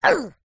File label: cartoon voice high pitched pain grunt